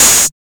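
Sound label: hi-hat, Hard & Loud Techno; USB Soundscan vol.01; AKAI; HH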